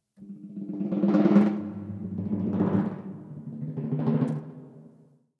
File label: Bass Drums